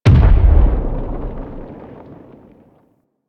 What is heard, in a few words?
Golem Step Far; Warframe; GolemRemaster; Plains; Footsteps